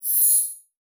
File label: Multimedia Internet CD-Rom Flash Short